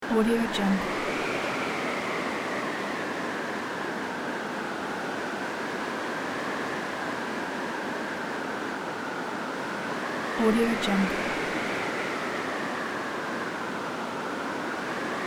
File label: dune wind high loop